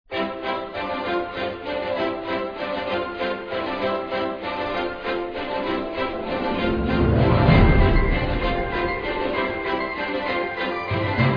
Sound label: bgm; ArcherWorrior